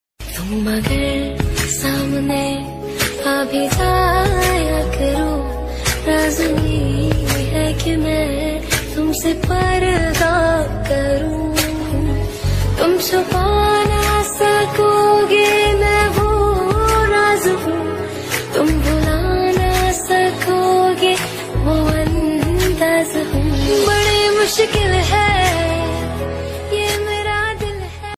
romantic international music ringtone love bgm ringtone